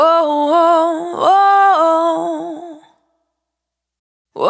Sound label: Urban, Single Voice Melodic Loops, Vocal Samples